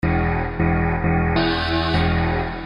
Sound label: loop